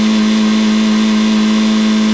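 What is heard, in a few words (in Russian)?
продолжительный звонкий звук работающего моторчика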